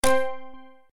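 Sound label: button bonus collect click; Marvelous Circus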